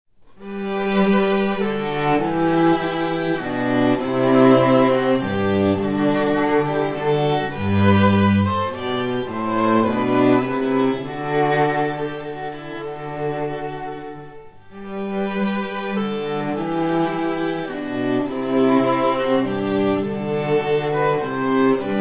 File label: trio frog gaillard